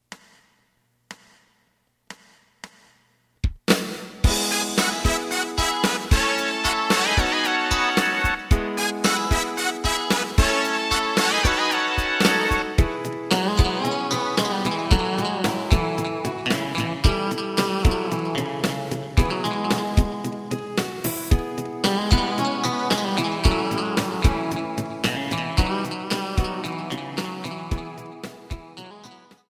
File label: bass